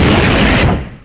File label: Flame; X-men; weapons